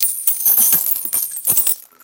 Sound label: bright metallic coins clinking together